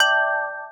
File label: System Notifi